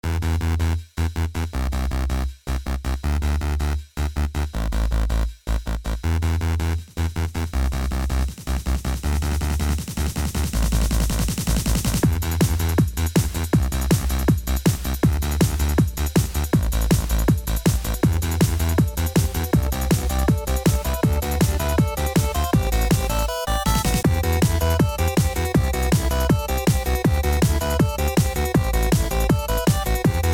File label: bgmusic